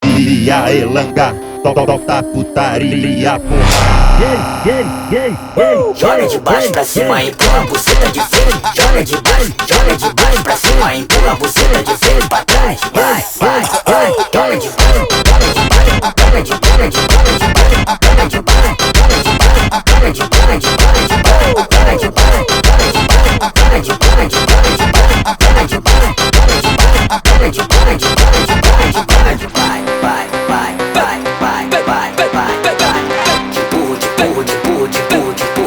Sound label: Brazilian Phonk